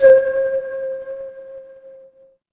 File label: instrument singing bowl